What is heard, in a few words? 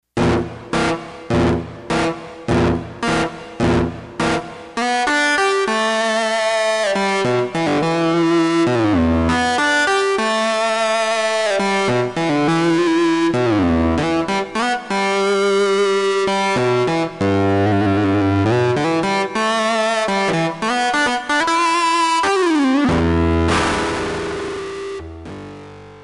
gtr dist